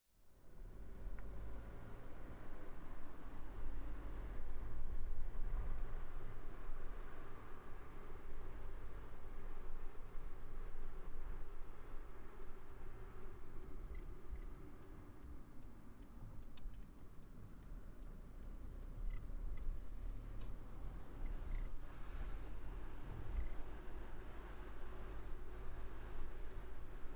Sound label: Tascam DR 44WL Stereo Mics Ambiance